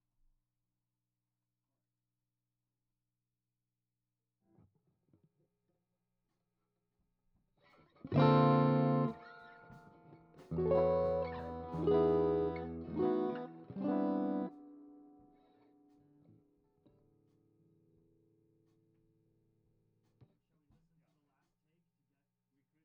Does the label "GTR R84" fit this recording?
Yes